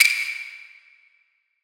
normal hit whistle; [DT] Rafis HDDT f5

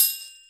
normal hit whistle

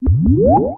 spinner spin; Kurisu Makise v2.0

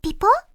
match not ready; [Mirakuru] Hanasaka Yui Wangi Wangi

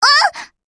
combo break

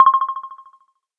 menu hit; BlackCatSkin; BlackCatSiRi

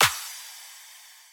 heartbeat; IJN Yamashiro v1.0.3 (16-9)

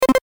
pause hover; summer pockets (pink)

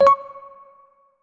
key movement; Chitanda Sagiri+blue angel+changed version

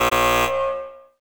section fail; Katou Megumi by iEddie

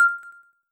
spinner bonus; GGO-Sinonv2

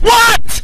combo break; MINECRAFT KRUGI

